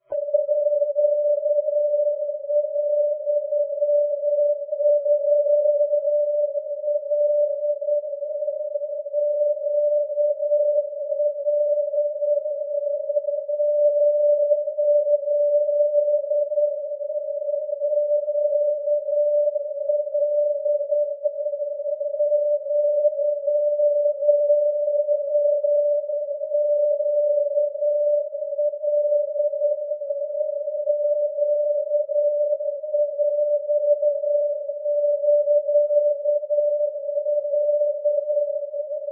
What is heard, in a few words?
ndb quelimane; quelimane ql-266 mau